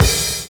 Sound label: BIG ROLL; Univers Sons - Kitch 70s